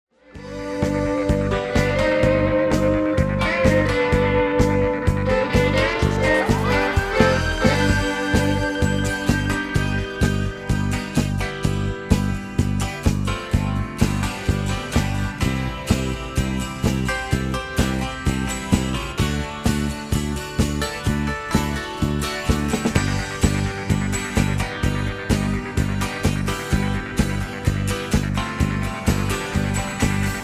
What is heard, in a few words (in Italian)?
Magnifici eroi strum